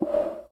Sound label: out fade